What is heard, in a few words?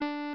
Race Countdown Beep; pac man kart rally java